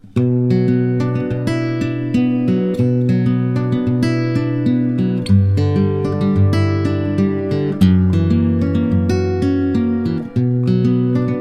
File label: Fingers hall